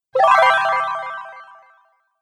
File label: Fairy Magic Spell; Magic Sound Effects